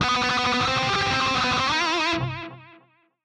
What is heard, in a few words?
Guitar Lick; Metal Tales Fury of the Guitar Gods